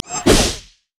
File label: steam golem crit attack; LoL; blitzcrank